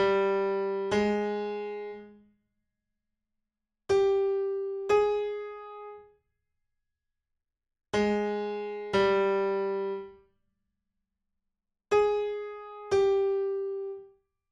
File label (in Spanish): Intervalo segunda menor